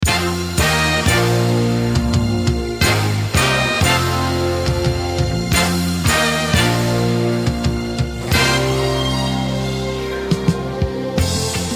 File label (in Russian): Fanfary